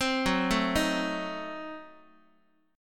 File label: F sharp Diminished 7th, Guitar; arpeggiated; x,x,3,4,1,2; 4A,4E,5A,5D,5G#,6C#